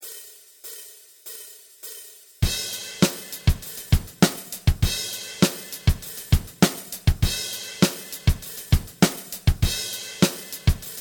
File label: Bass Guitar